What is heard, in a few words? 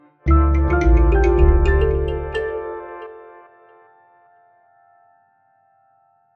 Sound Logo